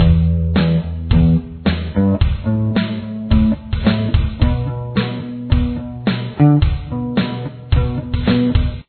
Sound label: bass